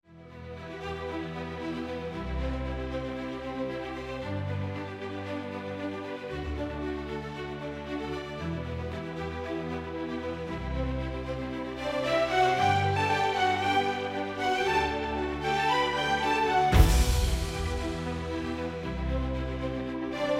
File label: combat